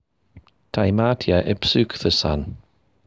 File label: Clothes Drying; Household Chores